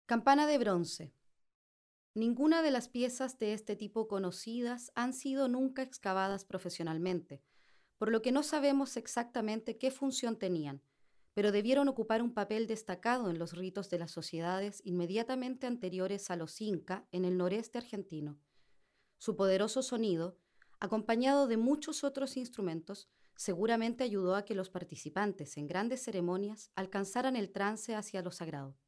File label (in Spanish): campana de bronce; audio-guias; espanol; introductoria